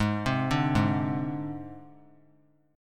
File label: Ab Major Flat 5th Ab; AbMb5 arpeggiated; JGuitar